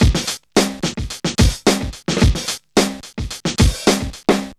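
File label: 109 bpm, Funky loops; Zero-G; Funky Elements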